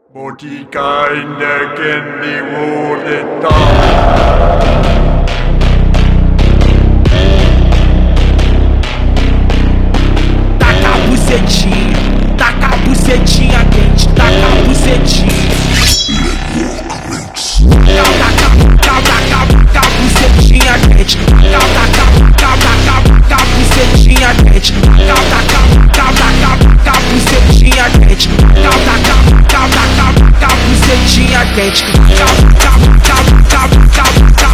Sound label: brazilian phonk